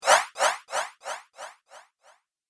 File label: Vanishing Whoosh; Whoosh Sound Effects; sound design